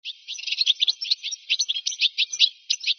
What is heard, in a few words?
goldfinch